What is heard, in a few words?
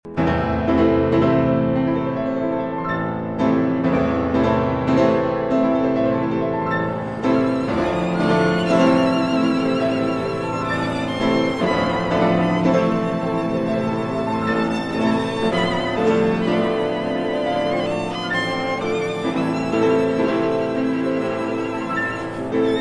Piano Trio in A Minor; Op. 50, TH.117; Tchaikovsky